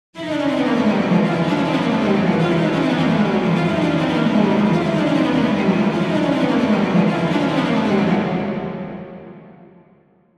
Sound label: Adrenaline Strings Mid, Horror Strings